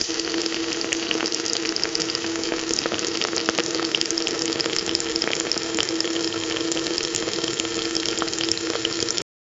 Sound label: boiling cooking